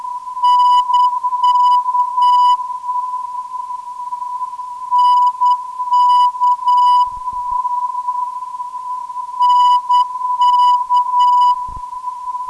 494kHz, ndb

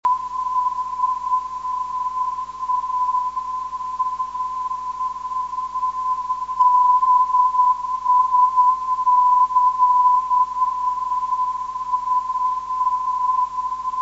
dsa 351khz, ndb

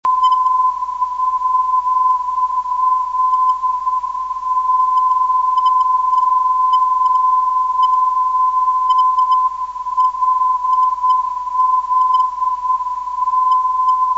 320 kHz, ndb

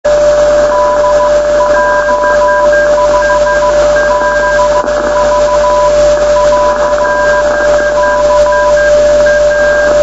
473.6khz

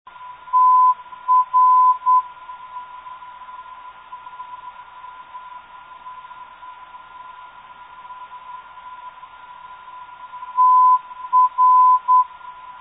290khz, ndb

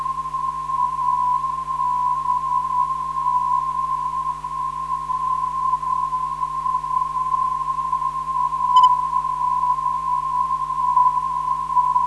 321kHz, ndb

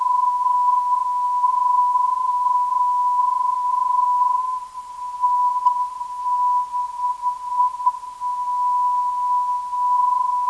NB 361kHz

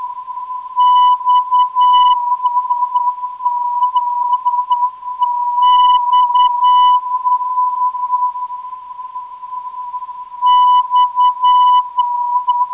X 360.95kHz, ndb

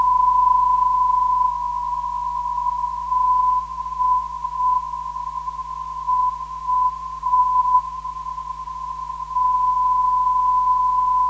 321kHz, ndb

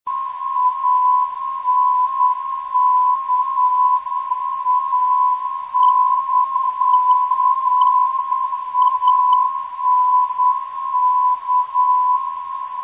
ank 357khz, ndb

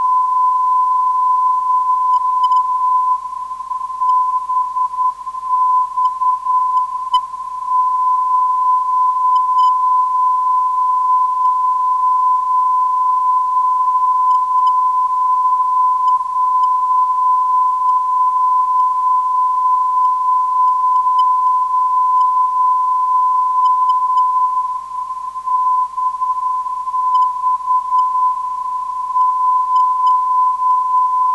393kHz, ndb

